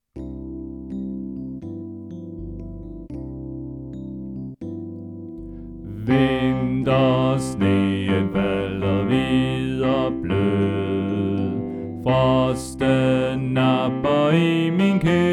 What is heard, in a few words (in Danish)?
Bas; Stephans-koret; oevefiler